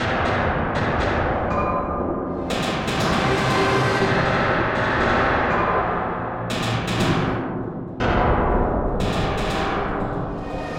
Danger Tonal; Insanely Twisted Shadow Planet

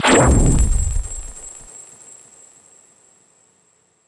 Chain Summon; helltaker; Judgement

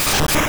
High Jump Kick; sfx; attack moves; pokemon; gen 2; gsc

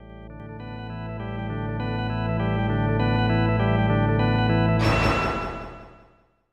Fanfare; super valis iv; snes

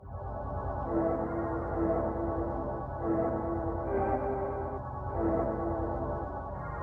Pit and Temple - Ambient

